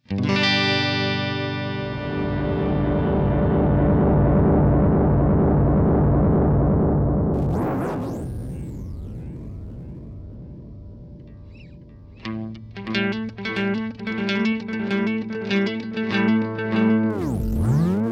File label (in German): Silos Delay Tape Tweaking; EQD